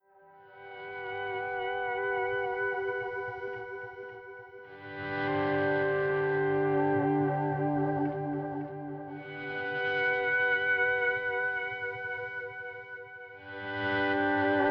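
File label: Country Guitar, Verse 1 Alt; Digidesign; Pro Tools Essential Loops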